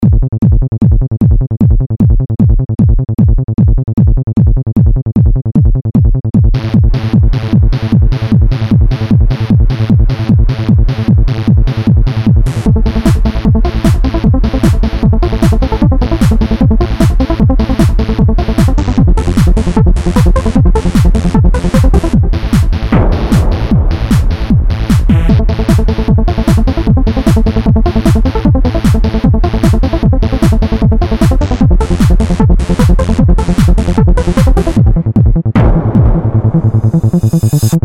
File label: Unfinished Trance